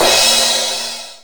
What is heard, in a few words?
crash, LM-2; drum machines